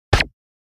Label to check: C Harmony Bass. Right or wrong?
Wrong